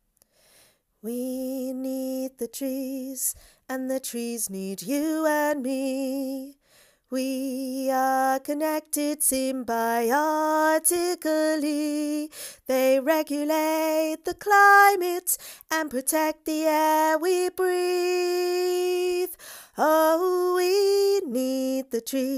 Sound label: alto